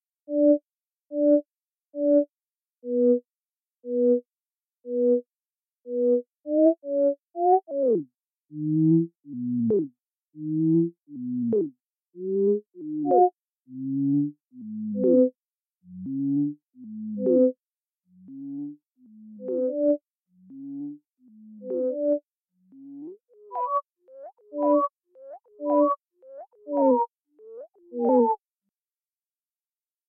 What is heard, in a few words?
tape delay